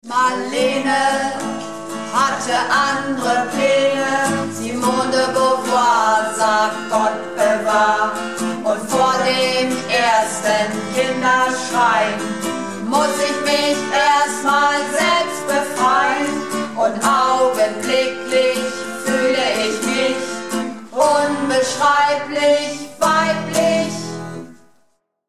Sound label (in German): Tiefe Stimme